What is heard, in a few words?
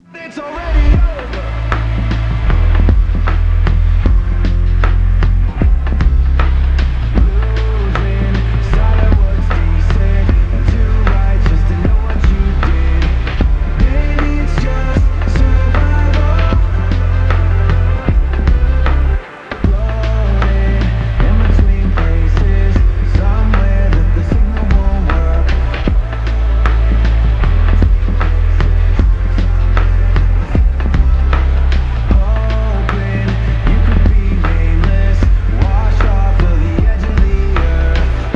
Gaming Soundscape; Endel